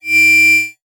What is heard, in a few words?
Multimedia Internet CD-Rom Flash Beep Mechanical